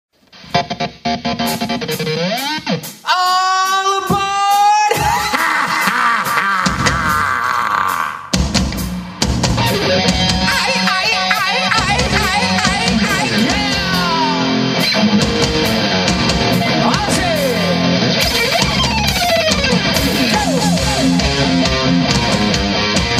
Live, Cover Band

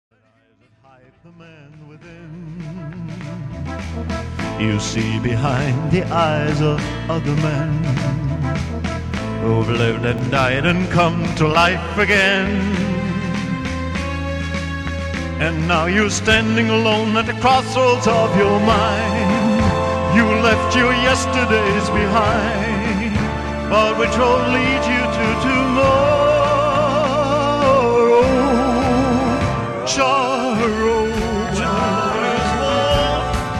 sings, impersonator; elvis impersonator

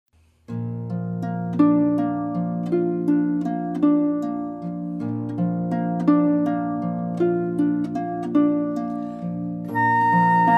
flute and harp; Ave Maria by Schubert; Christen Stephens

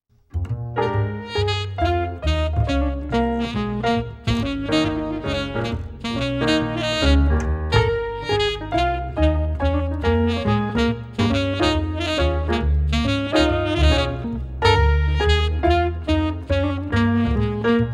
Jazz quartet

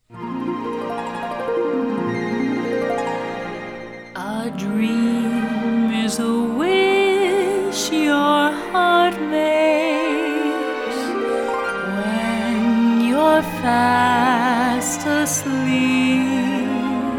Jazz, opera singer

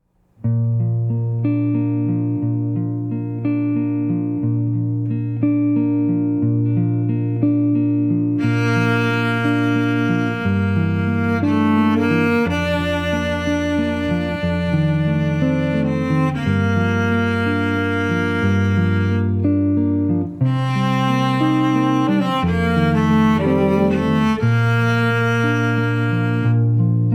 String Duo, Classical; Ave Maria by Franz Schubert

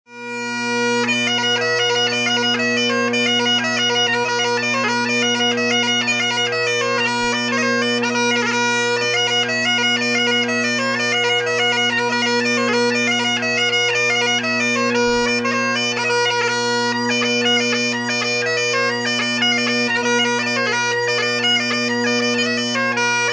Jig And Hornpipe, bagpipes

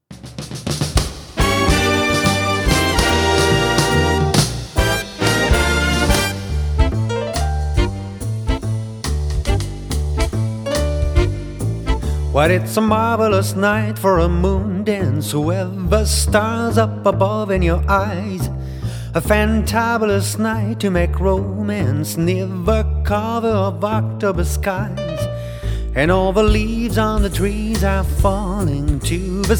Orchestra, swing band

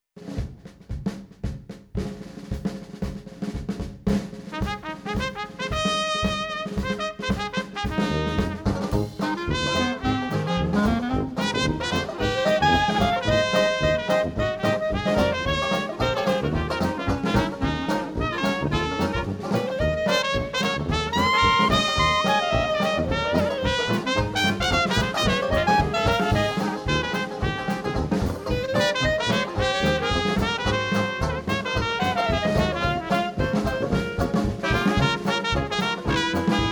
Quintet, Dixieland Jazz; Live Band; Bourbon Street; Hotlanta Dixieland Jazz

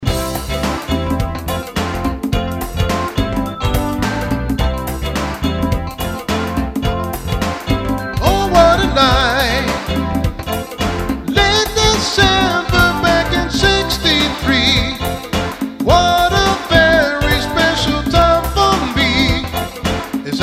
One Man Band

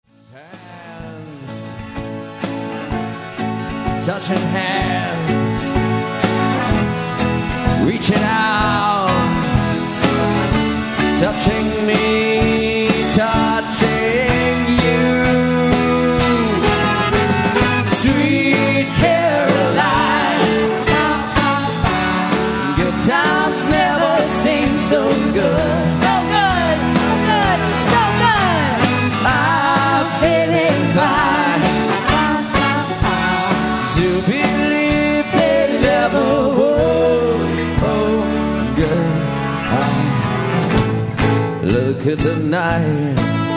Rock

